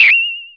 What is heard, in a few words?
choose